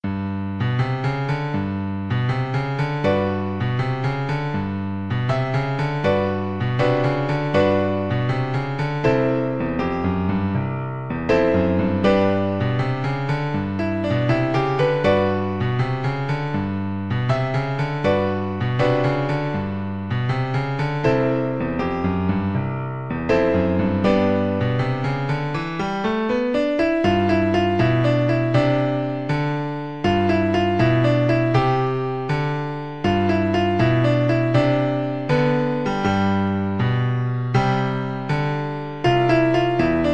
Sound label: cowboy blues, piano